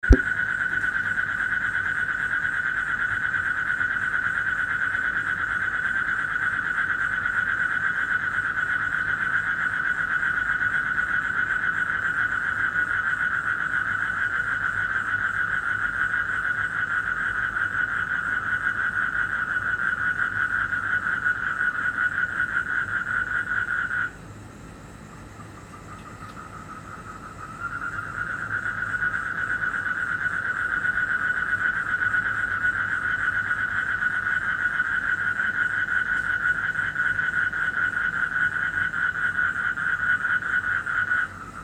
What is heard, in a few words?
frogs, Indonesia